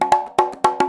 fill, percussion; InVision Interactive; Percussion Slam; PRIMADONNA

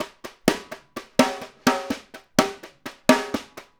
snare pattern; Drums; Snare Patterns; Essential Percussion

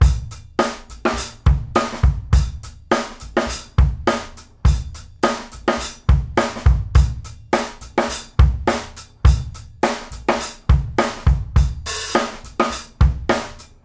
GROOVE; E-MU Producer Series Vol. 8 – Platinum Phatt

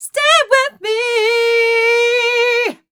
f mel e flat, fem Vocals

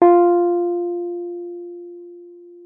WURLITZER, F4; Xsample VOL-1; 90 s Sample CDs; WURLITZER RS

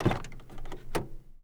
SMALL DOOR, Transportation Doors Hoods Brakes

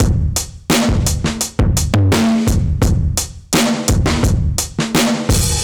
Old Drum, 85bpm, analogue circuit; musicradar

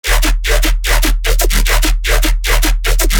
bass loop 150 D#; Virtual Riot

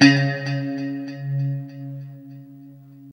LO E C#3, Guitars; Spectrasonics; Hans Zimmer Guitars VOL-1; JC-SHEEN

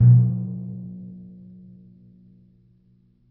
A#1 p, Timpani, piano; PowerFX; Downtown Orchestra; scales

